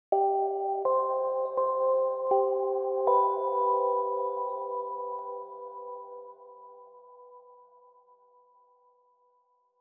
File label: Success Phrase; Planet of Lana; Rakuenpuzzle